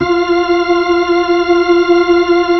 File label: B-3, Keyboards